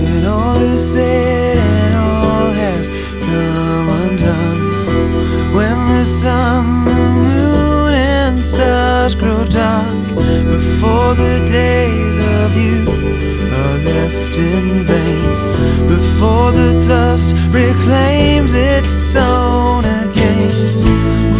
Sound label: breathe